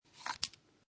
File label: Foley Handling Batteries; Clinks